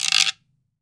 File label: GUIRO